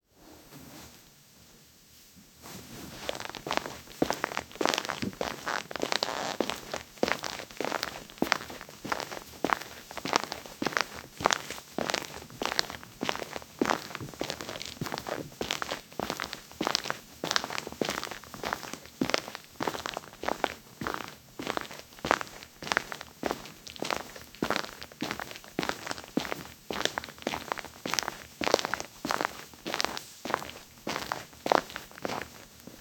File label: woman walking in a leathe, boots